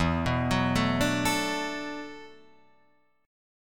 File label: E 7th Suspended 2nd Suspended 4th E down Guitar Drop D